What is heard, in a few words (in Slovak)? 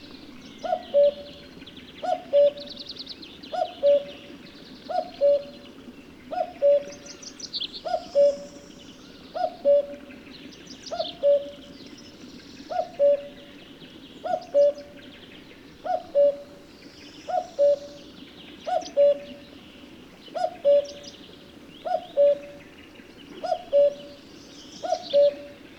kukucka